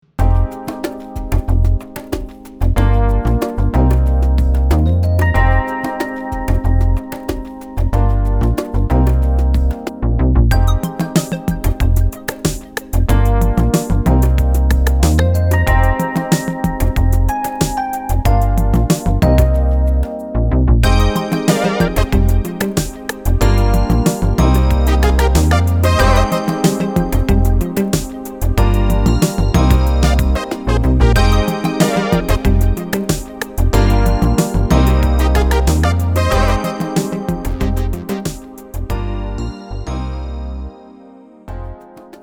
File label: funk synth